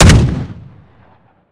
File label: weap fire; gmod; weapons